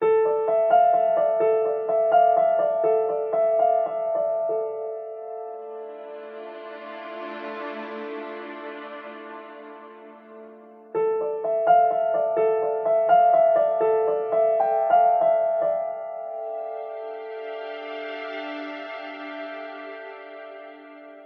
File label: piano, Loops, Sad